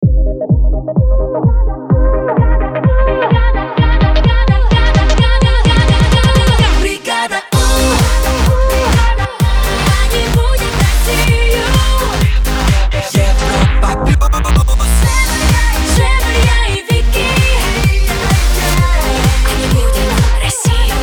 Jingle Main ID; Europa Plus; Brigada U

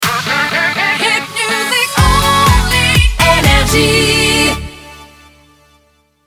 Jingle Shotgun; NRJ

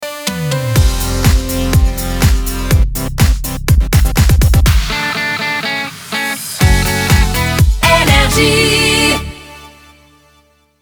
Jingle Ramp; NRJ

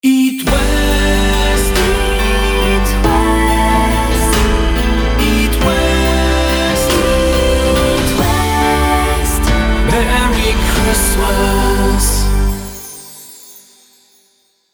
Xmas Wonderful Christmas Main ID; Hit West

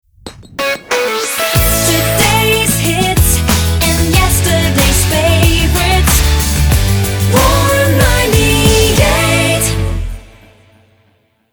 Jingle Shotgun, Medium 093bpm; Warm; Warm 98

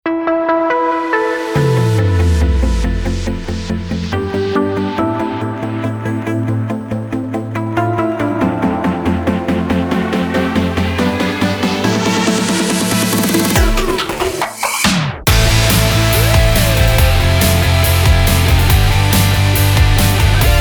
Jingle B Ramp; Europa Plus

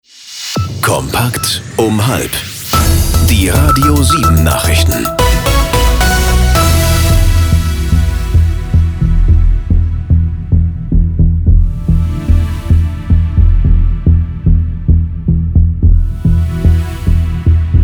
News compact Main ID, 110bpm, Emaj, Bumper+Bed; Germany; Radio 7; news kompakt

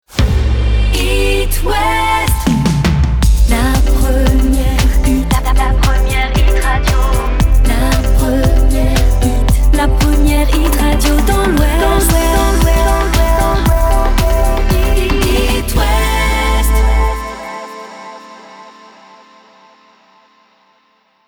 Main ID; Hit West

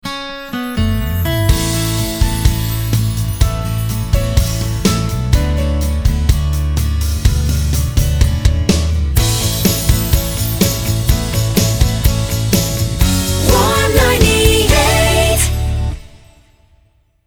Jingle Ramp, MediumHot 125bpm; Warm; Warm 98

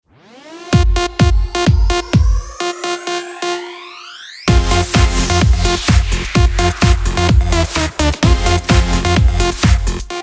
Jingle Ramp; NRJ

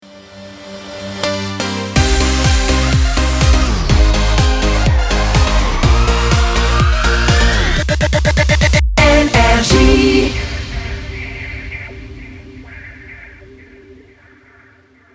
Jingle Ramp; NRJ

